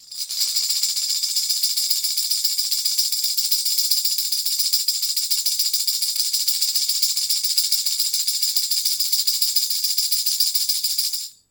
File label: tamb roll, Tambourine; Idiophones; Struck Idiophones; Tambourine 5